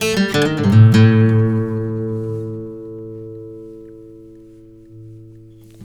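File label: baritone lick A, Blues Guitar; ILIO; Fretworks; 120 BARI LICK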